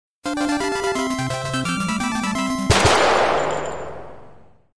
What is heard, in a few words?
gun; kirby